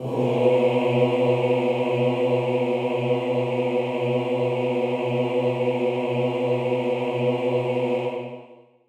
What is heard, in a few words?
chorus male b2; Sonatina Symphonic Orchestra